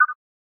Video Record, ui; Pantech Burst

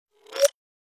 connect, Nokia 808 PureView

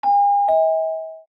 Doorbell

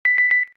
camera timer last; LG Optimus F3 MS659

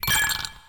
ice, notifications; ZTE Grand-S-2

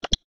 Unlock none effect, ui; Samsung Galaxy Trend Plus GT-S7580

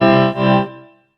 notifier boomer; ZTE Open-C

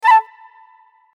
Flute Trill; Vertu Signature Touch; LSO

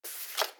haptic peaking display; Sharp; HAPTIC 3LH PEAKING DISPLAY; AQUOS sense lite SH-M05

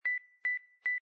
Low Battery, phonetones, LG A250 system

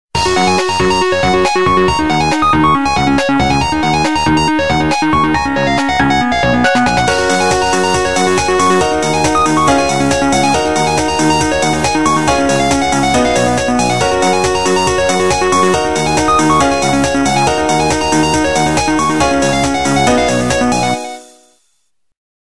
Swimming, Nokia Tones

